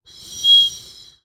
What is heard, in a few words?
Bird whistle, clay, painted, dry, airy, wispy, swell, smooth, strong; Eiravaein Works; Vaeyan IV